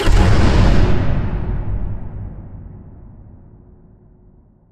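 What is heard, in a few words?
explosion distant medium; props; explosions; Portal 2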